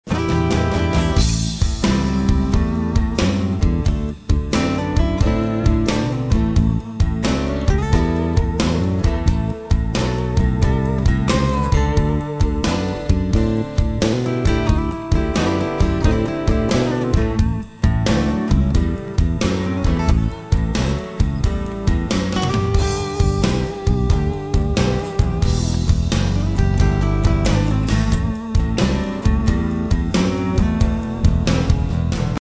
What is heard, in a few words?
Mississippi Blues